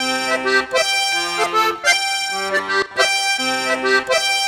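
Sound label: Accordion 107bpm C, African; Quazibeat - African Secret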